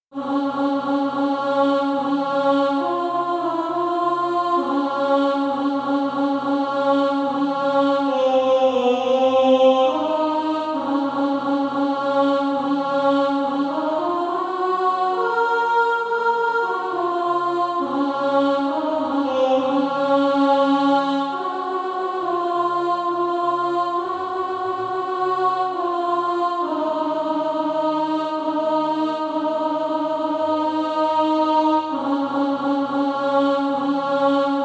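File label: alto, Sheet music (SATB) including Practise Music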